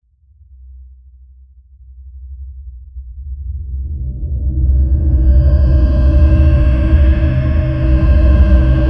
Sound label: it creates heavy and tense